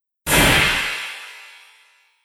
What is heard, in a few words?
Metal Explosive Impact; Explosive Impact 01; Miscellaneous Impact Sound Effects; Andrew Fleming